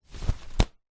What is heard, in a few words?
foley umbrella open